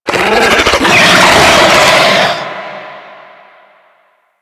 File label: Infested Ancient Healer Call, Enemies; Warframe